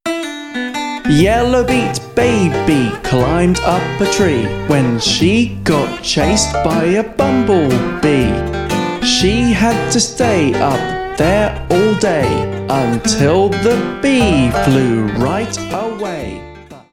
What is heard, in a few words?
rap